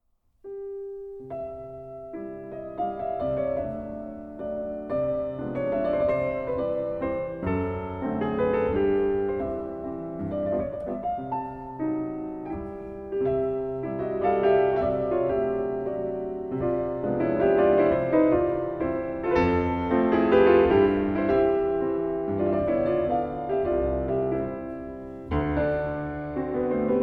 Mazurka

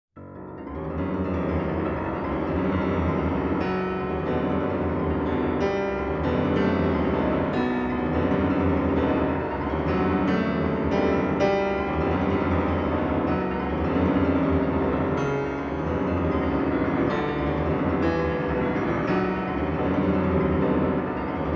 Ballade h moll; Liszt

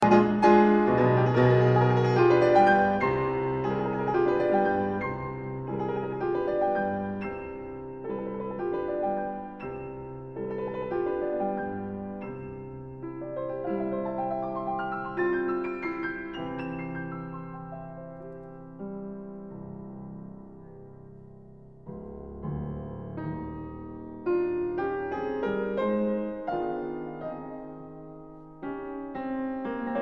Ballad Op. 47 A flat major

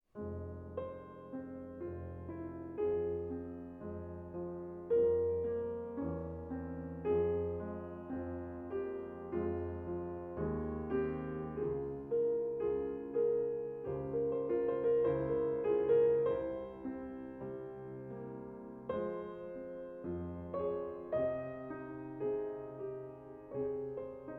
sacred piano

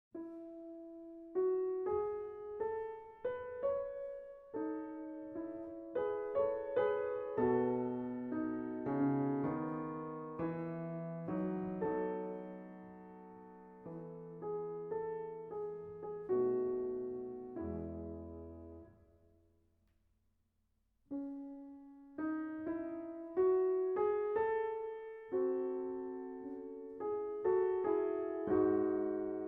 Piano Concerto No.1 in E minor Romance larghetto; Op.11

